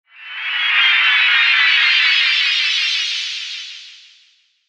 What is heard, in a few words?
Sweeper Warble Descend; Ascend Spacey; Blastwave; Production Element Imaging Element Accent Transition